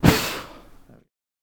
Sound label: Fire Staff, Fire sound effects